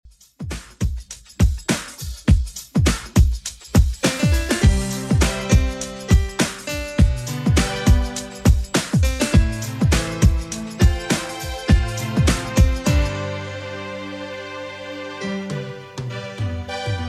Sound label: 80s Redrum 102bpm